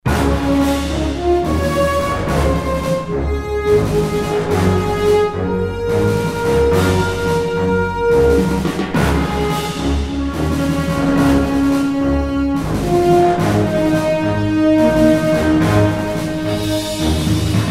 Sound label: Success; bridgecommander